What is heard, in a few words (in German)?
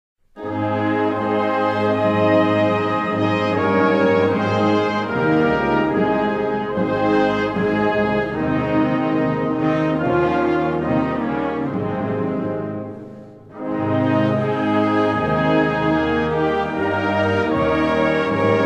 Die Bayernhymne Musikkorps der Bayerischen Polizei